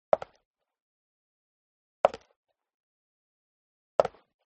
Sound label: FOLEY STEP High Heels Concrete Single Left; smartsound